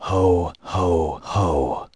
hohoho; bloopers

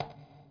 bong; Hitman; Music

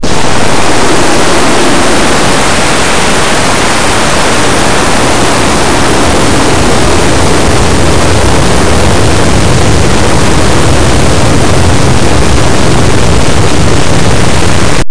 plane

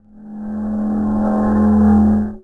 Noise; aloneinthedark4

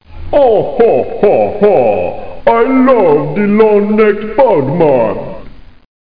fruit sings; How Many Bugs in a Box

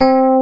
Dyno A4; SoundFont - The Developer's Choice - Volume 1

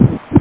beat loud; G-Netix; ISM

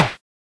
ele Snare

